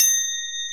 BEL, Orchestral Perc; Roland LCDP03

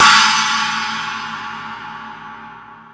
Rock N Roll, E-MU Pro-Cussion

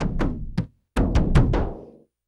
Frame - Kendang Short; Sonic Foundry (Sony Creative Software) - Friendly Fire; Drums

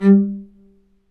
Low Marcato; Roland L-CD702; Combos 1

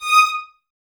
Violins Detache D#6; String Ensembles; Miroslav Vitous; 11 Violins

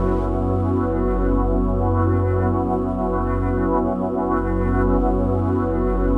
PAD, Dance Mega Synth

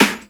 SD, HIP, Dance Mega Drums

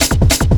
Pickup, Drums & Percussion; Sonic Foundry (Sony Creative Software); Futurist Drum & Bass

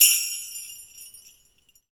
SLEIGH; Roland LA; ETHNIC

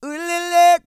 SING, World Voices, CARIBBEAN

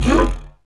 SHRIEK; Roland - LA Composer vol. 1

